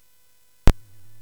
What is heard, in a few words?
Dark Castle, CSG8580R5